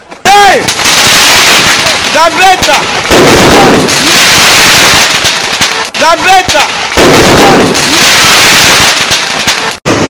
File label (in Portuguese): explosao